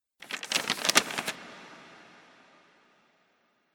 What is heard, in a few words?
Monastry Manuscript Paper